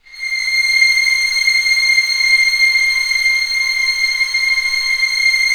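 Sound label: STR C6 FF, Orchestra Strings; Sound On Sound - Peter Siedleczek's Orchestra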